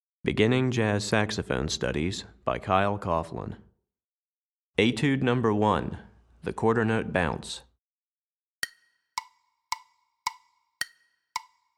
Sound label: Tenor Etude, Saxophone; Recordings For Beginning Jazz Saxophone Studies for Tenor, Volume 1; Jazz Quarter Notes